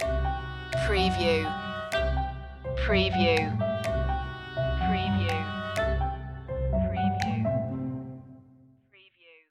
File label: a quirky flourish stinger